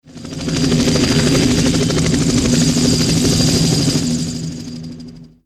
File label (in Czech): helikoptera, Dopravní prostředky